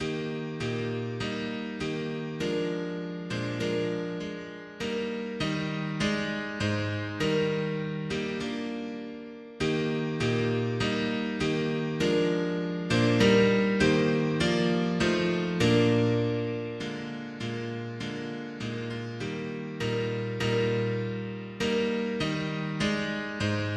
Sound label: mono piano; hymns; Himnario grande